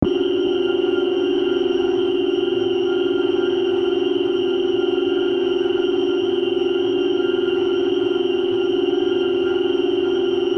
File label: noise